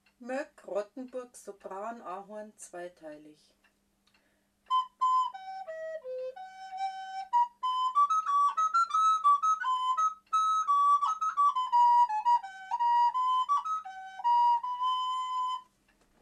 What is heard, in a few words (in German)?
Moeck, floeten; Rottenburg